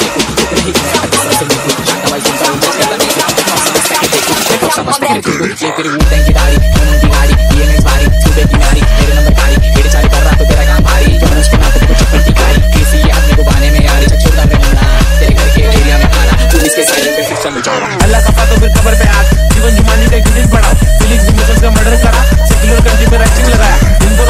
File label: MEGAMIX